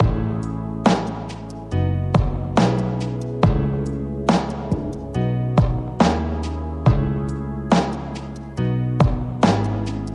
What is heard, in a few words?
Groovy Chill Funk